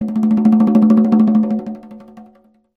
message notification sound tone, sms; samsung

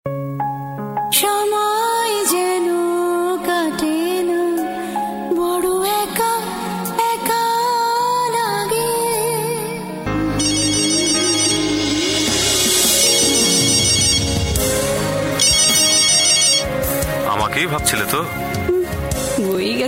soft tone ringtone

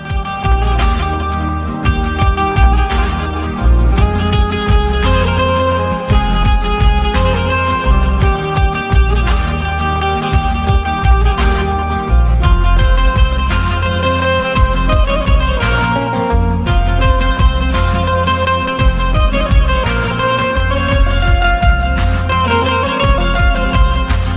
beautiful sad music ringtone, bollywood

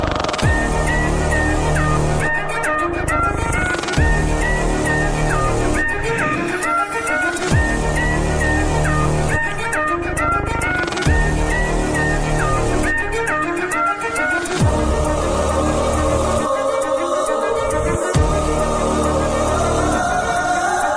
instrumental ringtone bollywood ringtone

